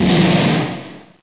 fire

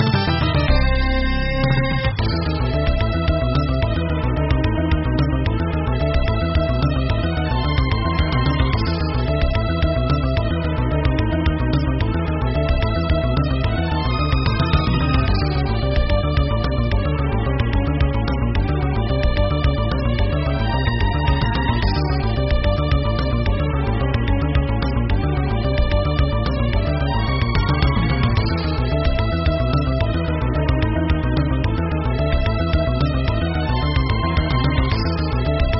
Battle

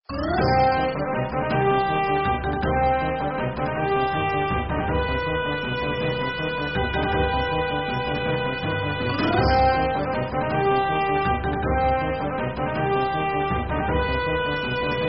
Battle

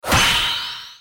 sharp up

